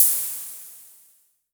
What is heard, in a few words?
Hi Hats Open; LIMAT Div Dept